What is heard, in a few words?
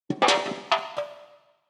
Can